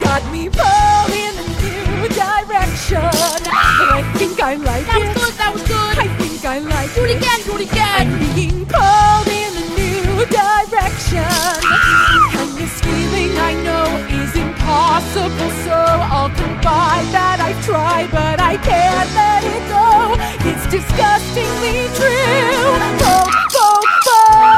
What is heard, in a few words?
Female Pulled